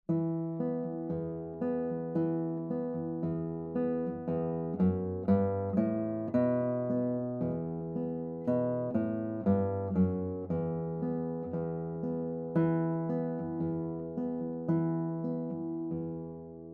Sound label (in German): Glockenlied